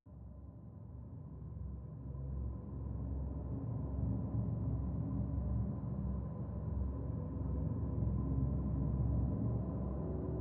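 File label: drone B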